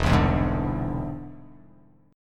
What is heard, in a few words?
E Augmented 7th E down; E+7 strummed; JGuitar